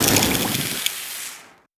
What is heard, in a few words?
unfill skull